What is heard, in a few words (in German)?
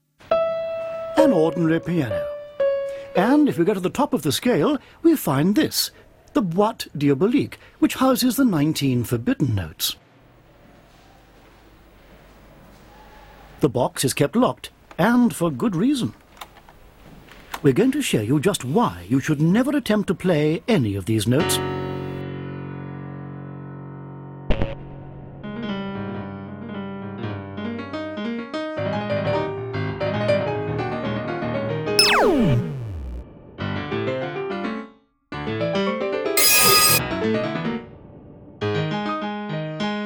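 Toccata und Fuge für gewöhnlichen Klavier und Boote s-Moll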